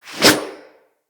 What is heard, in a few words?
Axe Small Swing; Weapons; Swings